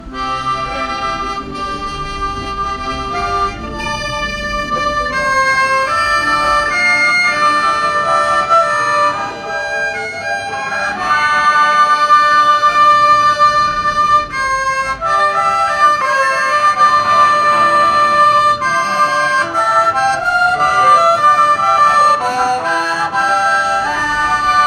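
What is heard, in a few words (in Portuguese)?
música clássica brasil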